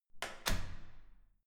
security door close soft

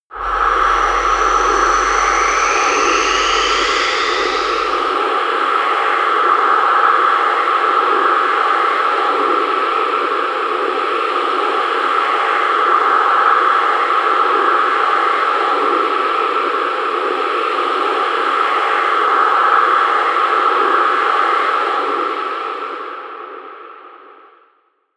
stormy, music elements

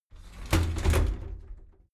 shower slide door inside close regular halfway

